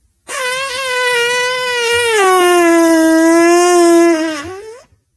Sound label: long satisfying morning fart